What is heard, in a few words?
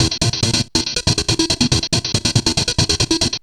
Treat Guit, Industrial Nasty